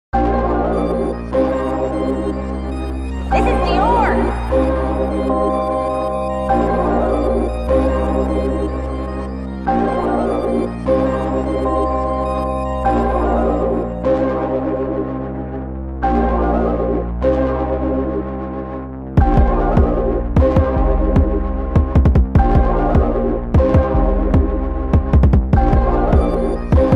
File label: Instrumental type beat